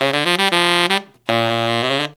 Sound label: 160 BPM, Horns